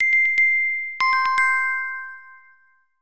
Glassy Tone, ringtones; Samsung; Galaxy Watch 8

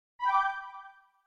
Fold open, Nokia N76

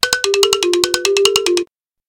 Xylophone; Coolpad; Dazen-X7

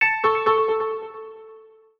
Alert, notifications; Blue; Telstra

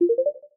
Call Connecting; Pantech; Discover P9090

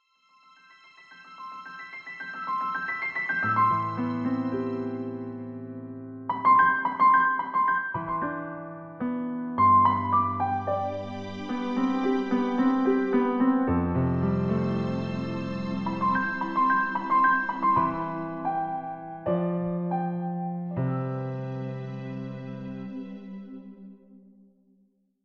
Piano; Itel; Power-80

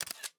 camera click; Honor X5

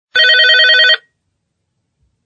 Office Phone; Sony Ericsson W300i; Ringtones

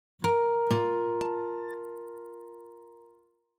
Harmonic, notifications; Vivo X27 Pro

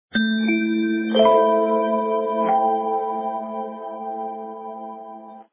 Attractive Sms; NIU; N109-Niutek

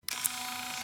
Zoom Sound; ZTE Nubia Z5S Mini; ui

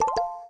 raindrop end; Samsung; GT-B7320 Omnia PRO ATT

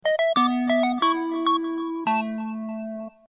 shutdown sound; Cherry Mobile Astro

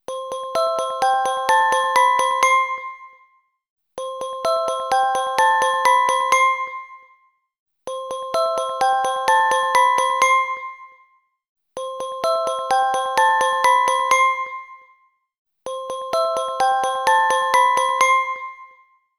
Xylophone; G3 D855; ringtones; LG

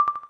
Xylophone

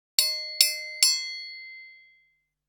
Ding; Windows Phone apps

